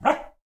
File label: corgi bark; smite; god daji skin15